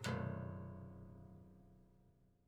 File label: Prepared Piano